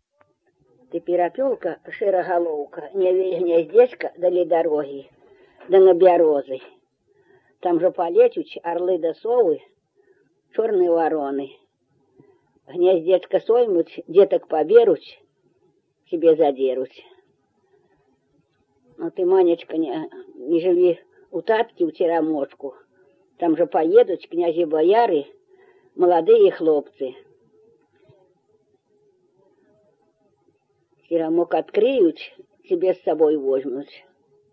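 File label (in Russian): Жнивные песни Себежского района; д. Горелово; "Пирапёлка, шера галоука, ня вей гнёздечка"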